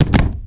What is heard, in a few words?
land wood, FootStep; WarRock